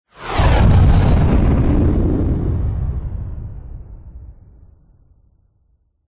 vehicles plane fighter jet burst into flames passing